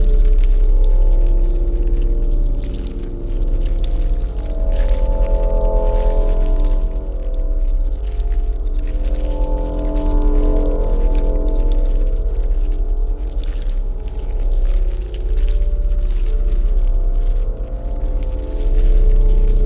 ant ship science station idle; Stellaris; biogenesis

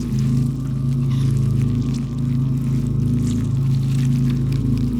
ant ship weaver idle; Stellaris; biogenesis; ships

